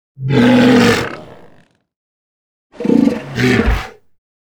West Indian manatee

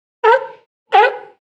Sea Lion California

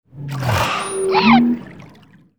Beluga

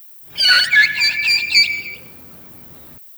false killer whale

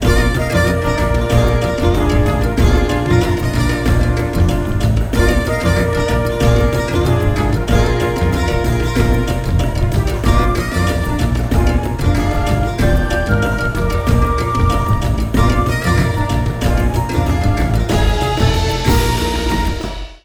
tune zoo

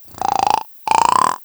orca whale

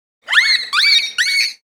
Whale Pilot Short Finned

